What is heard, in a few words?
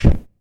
Gas Large Flame Ignite